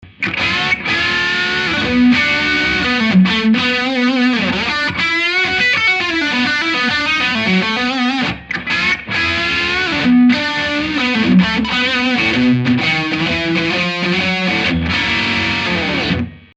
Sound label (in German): 56 brett neck, Strat; schwarzbach; VP-Strat-56